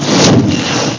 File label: Flamer; FinalOdyssey